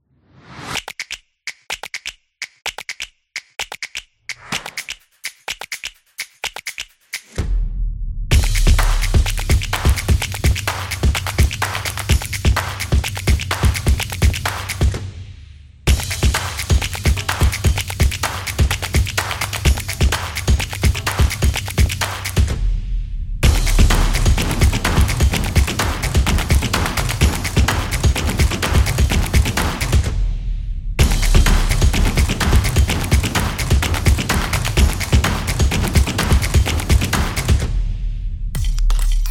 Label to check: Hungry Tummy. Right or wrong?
Wrong